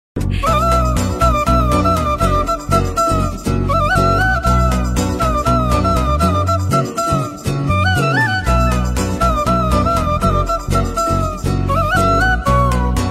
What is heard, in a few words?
flute ringtone